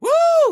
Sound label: woo